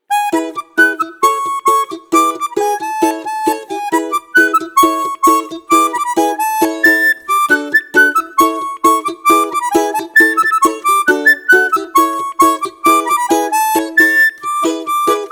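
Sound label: krakovyak naigrysh on five-key garmon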